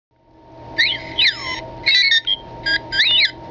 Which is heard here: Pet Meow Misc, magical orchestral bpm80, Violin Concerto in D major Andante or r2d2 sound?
r2d2 sound